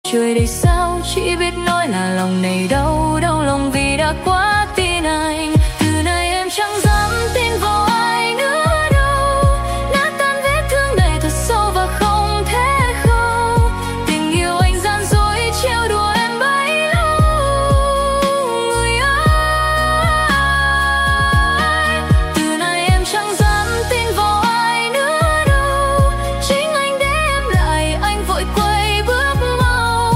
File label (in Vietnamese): remix giong nu